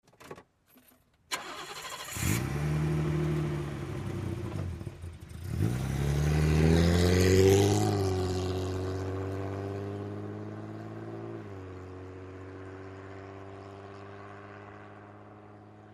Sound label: Bmw 530i Medium Dista